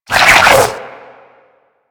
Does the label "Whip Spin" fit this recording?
Yes